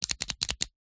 office pen retractable clicks fast multiple

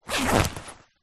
foley bag backpack zipper; zapsplat

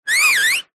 bicycle wheel turn rub squeak concrete ground; zapsplat

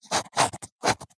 Handwriting Fountain Pen; soundbits

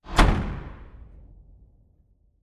Swell To Impact Distorted; bottlerocket

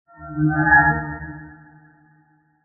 notification tone ascend octave overtones ascend; Skyclad Sound

